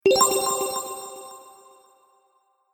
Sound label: Sparkle Idea, magic sound effects